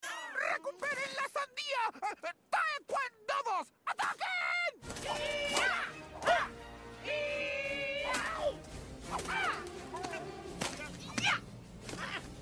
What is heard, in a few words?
attacking heeeeyaaa